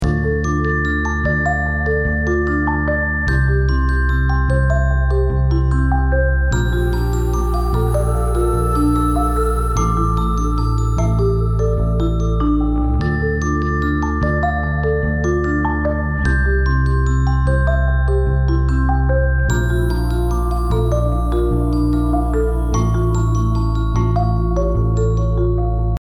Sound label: Healing Melody